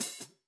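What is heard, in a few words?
Hats Pedal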